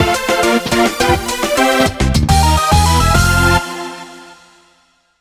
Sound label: JINGLE Clear; puzzle by nikoli s slitherlink